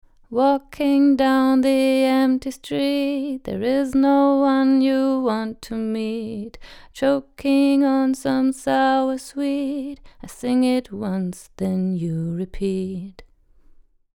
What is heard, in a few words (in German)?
Female Vocal ohne EQ; Millennia NSEQ HF